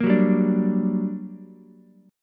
G Minor Major 7th G, Ukulele; strummed; JGuitar

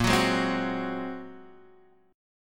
A sharp 7th Suspended 2nd Sharp 5th A sharp

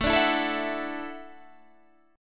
E 9th Suspended 4th A, guitar

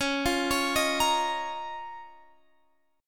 Db 6th Add 9th Db down; Db6add9; arpeggiated

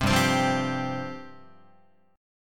G 7th Suspended 4th G down Guitar

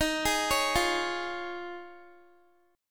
Eb 7th Suspended 2nd Suspended 4th Eb, Guitar; Eb7sus2sus4; arpeggiated

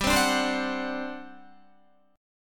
G Major 7th Suspended 4th, G, down, Guitar; GM7sus4; strummed

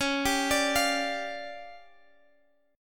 D Minor Major 7th Double Flat 5th, C sharp

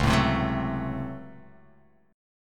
Db Major 7th Suspended 4th Sharp 5th Db down; DbM7sus4#5 strummed; JGuitar

